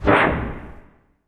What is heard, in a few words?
cinematic impact wipe